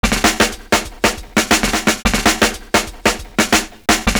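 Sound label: JUST A snare, LOOP; Univers Sons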